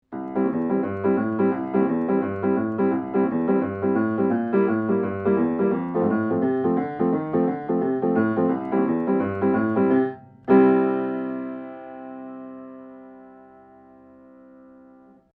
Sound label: Peluso 2247LE Cardioid Piano Rock